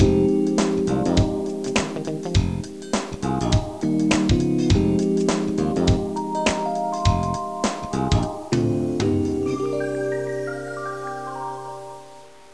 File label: music int